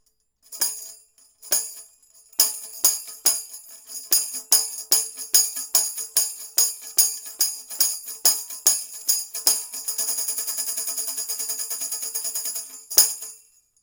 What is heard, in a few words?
tambourine